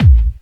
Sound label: Bassdrums Hard Kicks; Vengeance Samples